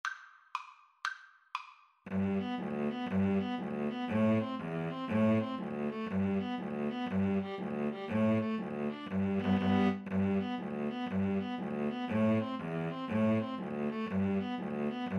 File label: irish jig medley, String trio